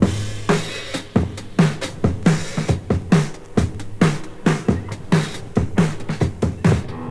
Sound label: 135 bpm breakbeat g sharp key; drum loops